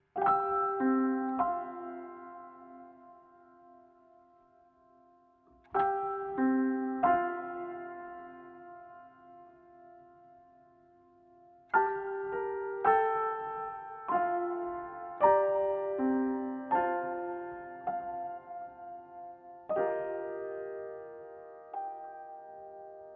tune

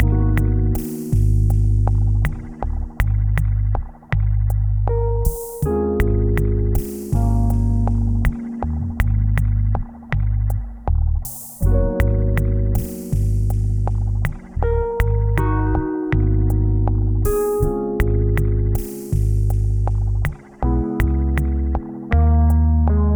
neutral Loop; pseudOs

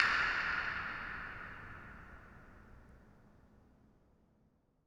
incinerator dirac

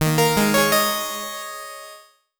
Jingle; astrofra

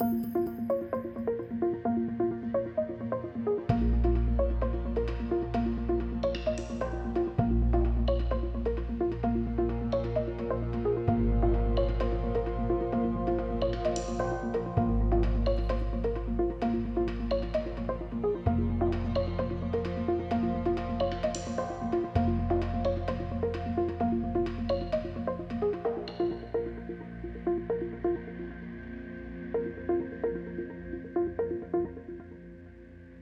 level loop; vikings

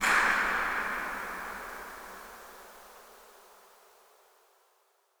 incinerator dirac

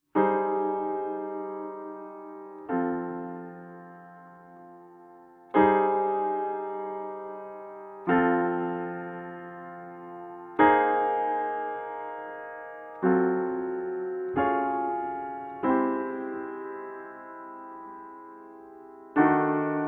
tune

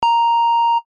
bip

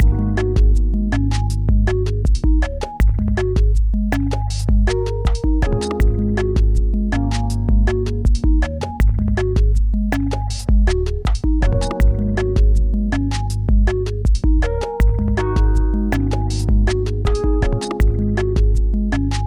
loop; pseudOs

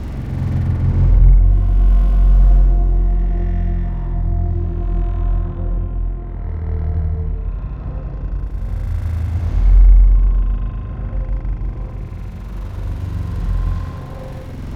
chase stretched loop; freeflight

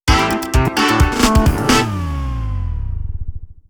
jingle

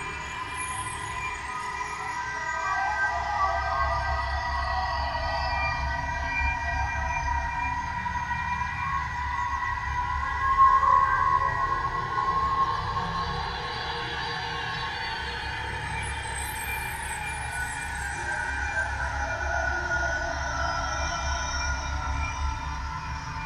ambiance; DS school project game

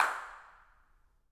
incinerator dirac

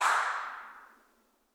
incinerator dirac

